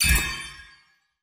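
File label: magic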